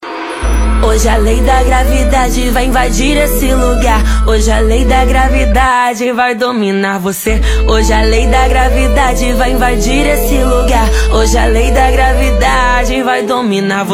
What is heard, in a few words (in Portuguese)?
Som Automotivo